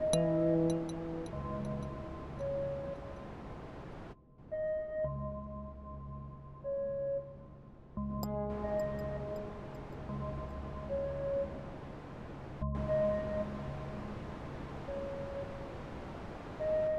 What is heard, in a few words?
sleep music